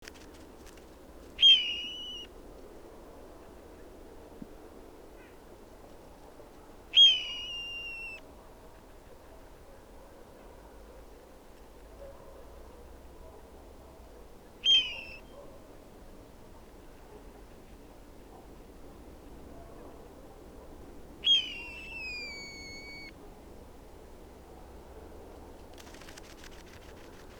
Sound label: Blue Jay Cyanocitta cristata